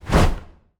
angel wing

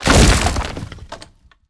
death open; darkesau

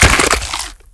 mushroom break

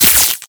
red lightning weak

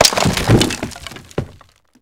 wood plank breaks

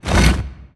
bighorn small hole open

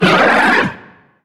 small growl; steven

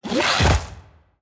war knockdown

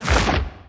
bighorn small hole close

